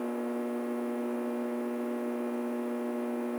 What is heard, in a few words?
office room tone, ambient tones